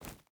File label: footstep barefoot forest run; Rust